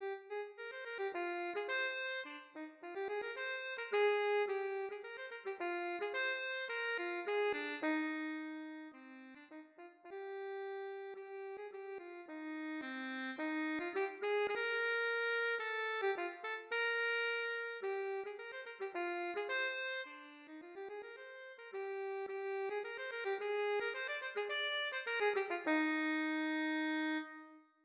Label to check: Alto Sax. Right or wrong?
Right